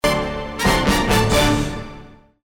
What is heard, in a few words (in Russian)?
shokiruyushij smeshnoj zvuk okonchaniya